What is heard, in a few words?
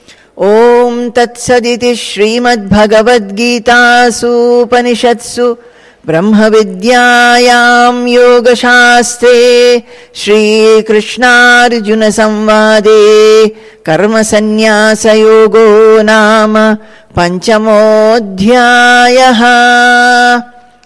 Ending Chanting; chapter 5 karma sannyasa yoga; bhagavad gita; conclusion